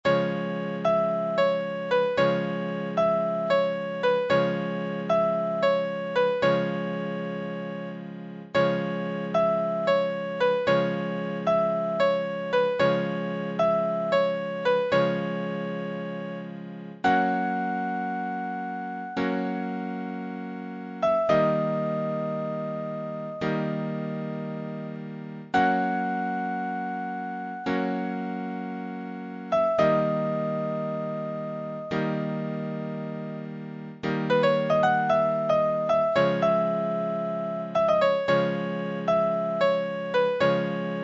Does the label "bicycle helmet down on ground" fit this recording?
No